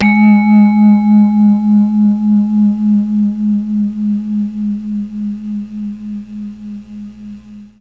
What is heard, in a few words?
G#1 VIBES, Mellotron MKII; Mike Pinder - Mellotron Archive CD